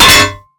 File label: hydrant land; Call of Duty Modern Warfare; physics